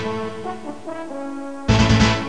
News Music short; DAZZEL; Voyager

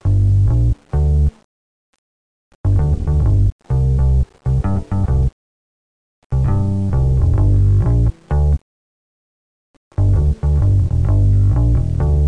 bass; MOBICLIC 13

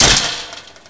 GATE; mtm2demo

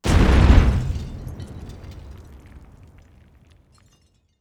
boom; wob2 demo

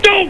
Error!; Mayhem; MayhemDEMO

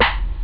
low; OctaMED SoundStudio V1

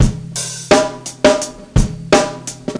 Badurim 86bpm; Loop Samples; Streetbeats

